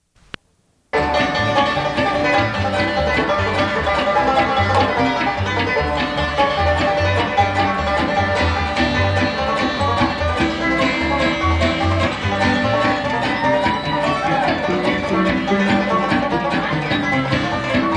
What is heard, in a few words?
Banjo tune fragment; Unidentified venue; 1977